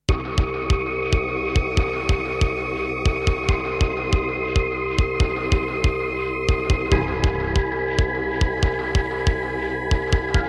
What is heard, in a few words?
Roland SC-8820; sigil doom episode 5